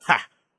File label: "ha", Voice